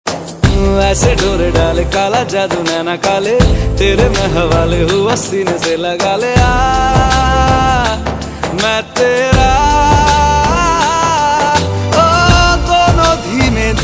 male hindi ringtone

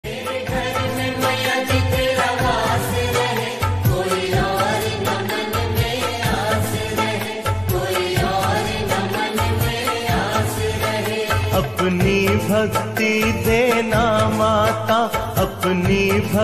Maa Durga devotional ringtone

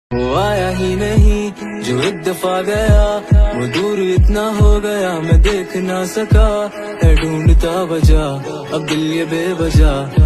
hindi rap ringtone

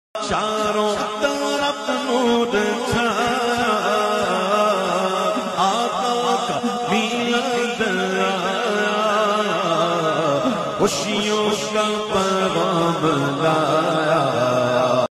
islamic ringtone

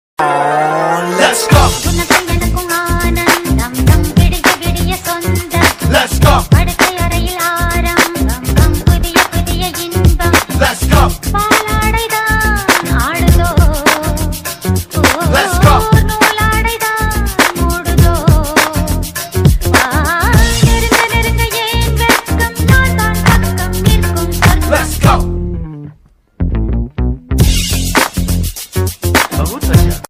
Remix tamil ringtone

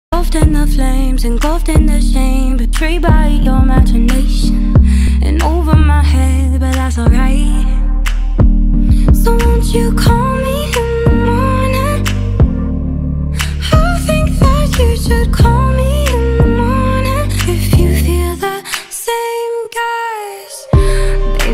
ringtone

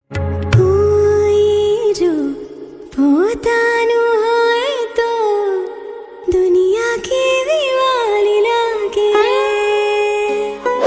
gujarati ringtone

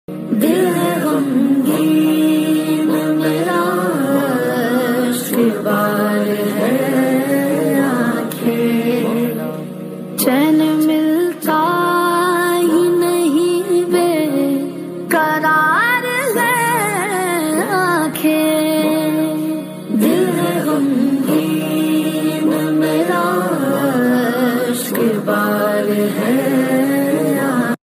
islamic ringtone

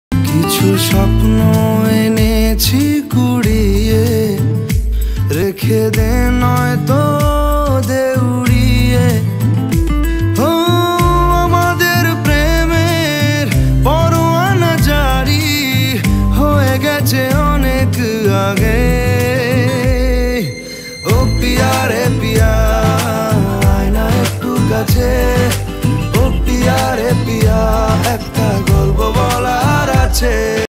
bengali ringtone

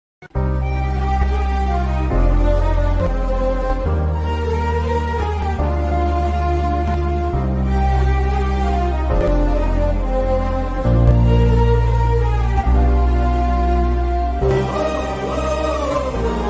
BGM tamil ringtone; Maari 2

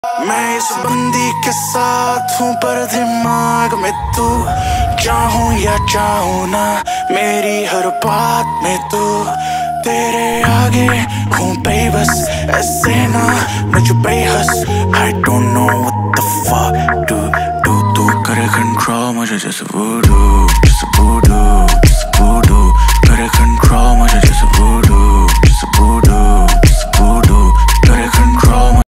hindi rap ringtone

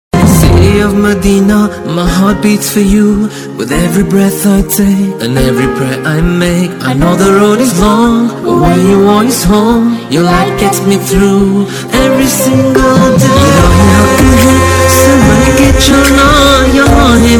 islamic ringtone

